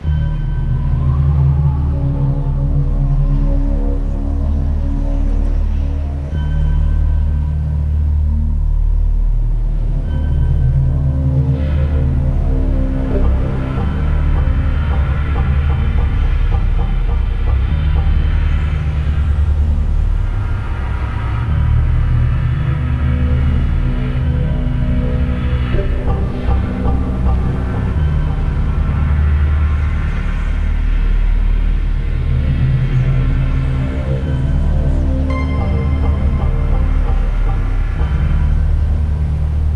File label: Smooth cave loop